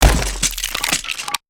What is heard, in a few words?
On Death; creatures organic